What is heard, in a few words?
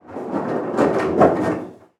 wood roll